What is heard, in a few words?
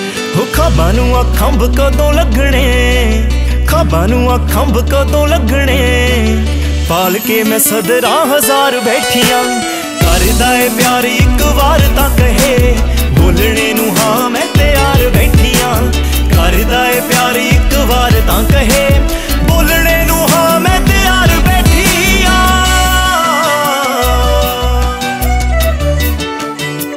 Punjabi Song Ringtones